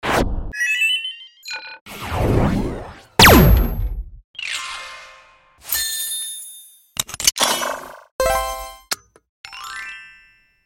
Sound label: sfx